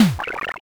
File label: door kick